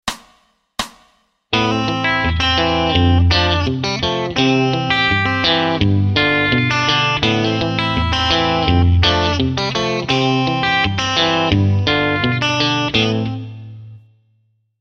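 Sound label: Rhythm Guitar Travis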